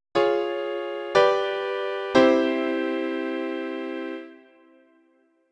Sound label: Neapolitan V I, Sixth chord